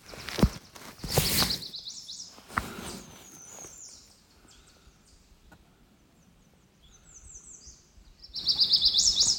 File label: song